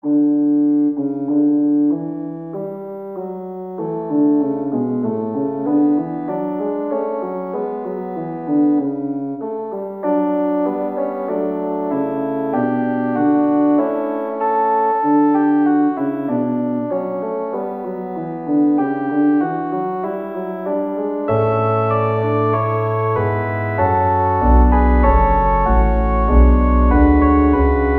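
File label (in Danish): Påskeblomst, orgelkoraler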